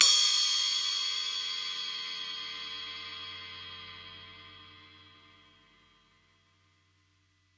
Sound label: ride; eddyflux